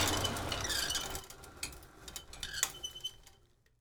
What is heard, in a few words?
Engine - Stop; Survival Game Sounds - Lite Edition